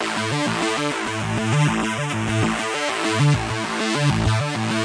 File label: arpeggio panning synth, 099; SYNTH AND ELECTRONIC LOOPS